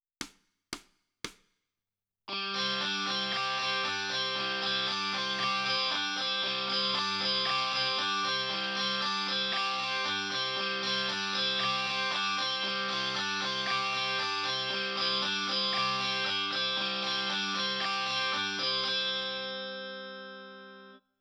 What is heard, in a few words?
Guitar